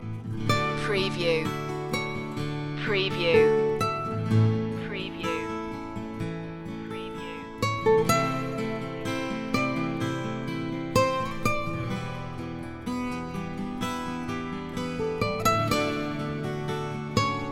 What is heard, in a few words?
guitar loop